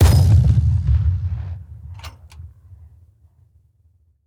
tools tankgun

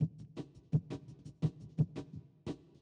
Main Remix Drums

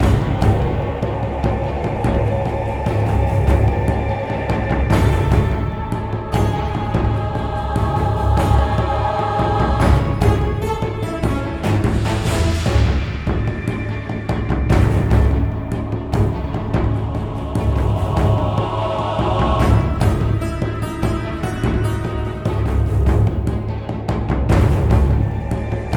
music combat loop

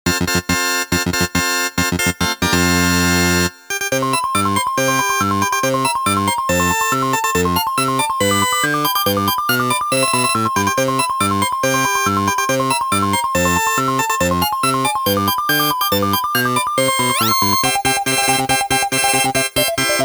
Jingle Bells; Taiko no Tatsujin Doka to Oomori Nanadaime 2006; PS2; 765 Version

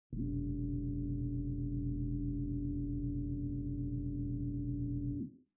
Terrain Gen Key Activation; Desert SFX

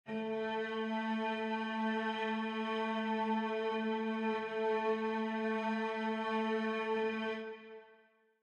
Forest Note A2

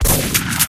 shotgun shot; guns n zombies

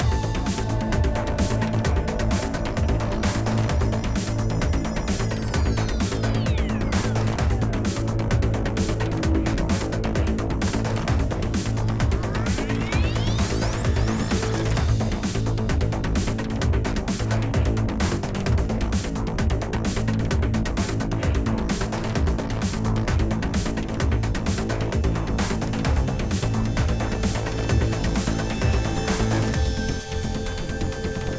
Combat 8CH 130BPM

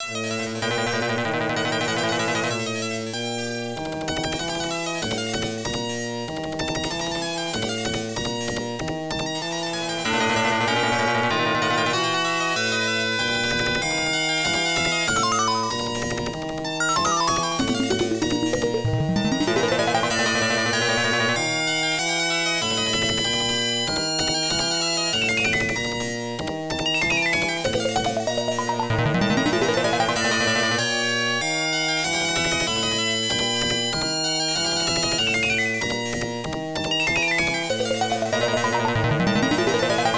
Level Boss; Level 2 Boss; Cerberus; Ghouls 'n Ghosts; Genesis

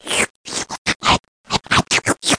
Sound slurp

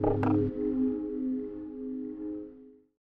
short outro

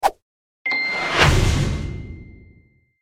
dailyquest complete; Might and Mayhem

